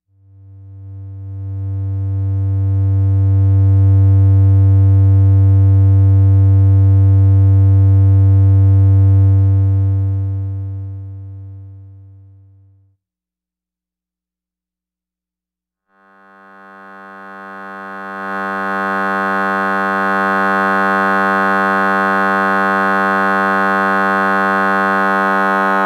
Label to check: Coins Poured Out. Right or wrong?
Wrong